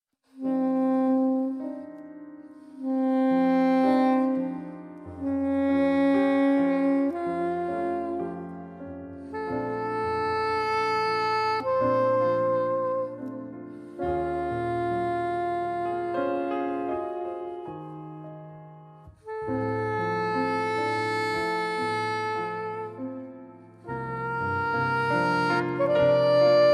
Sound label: ballad, sax